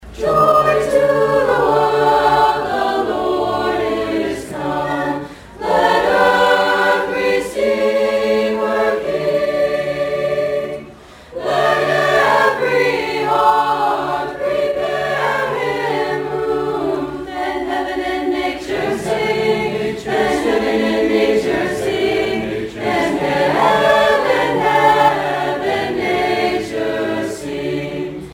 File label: Choir